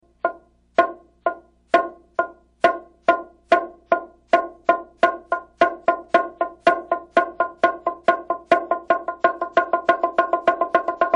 Bongô; instrumentos

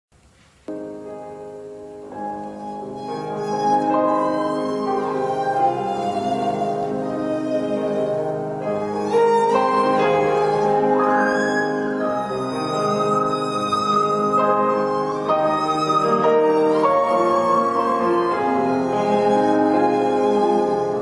serrote, instrumentos

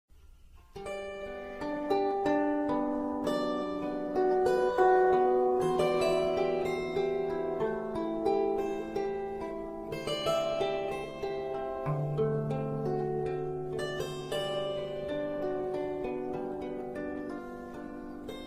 lira; instrumentos

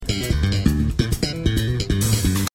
baixo; instrumentos

